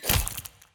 Death; Ion Shift

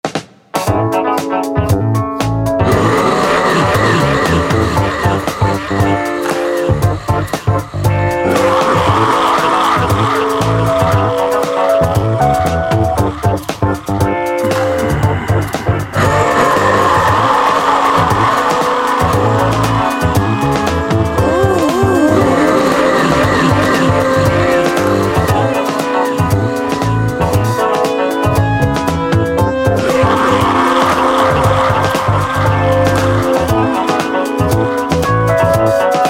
Advert Track

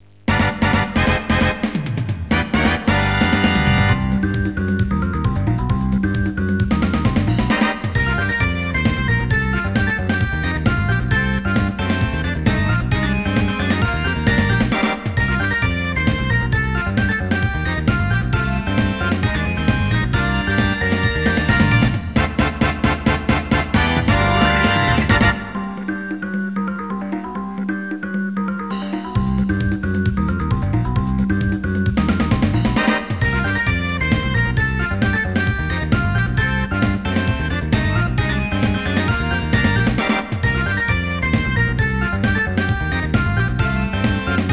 bgm march; eve bgm march

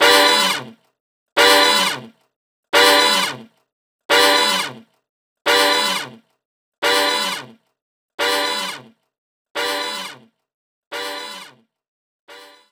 Basketball Hoops; Sfx Basketball Hoops

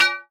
Physics Object Weight Impact

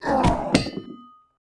Goblin Dead

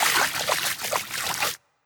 water; Legend of Keepers; Monnlightwater

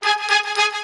Music American football

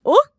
Startle

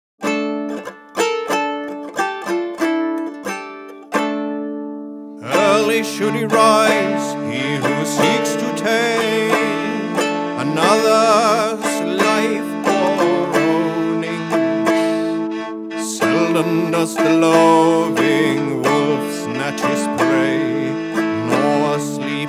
kitara vocal drum flute tagelharpa

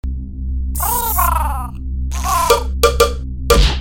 Claw pack

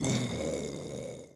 Enemy Bumbkin Idle

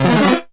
Attack

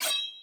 spinner Jump Grind; unsighted

